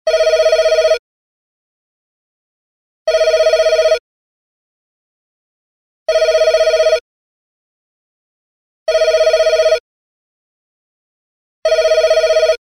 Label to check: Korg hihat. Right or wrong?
Wrong